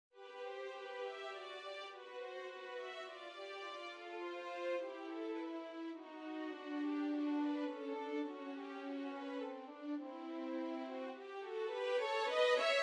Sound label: Duo 2 violins